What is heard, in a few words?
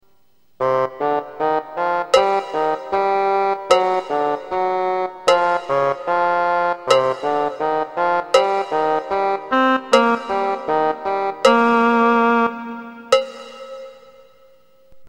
cowbell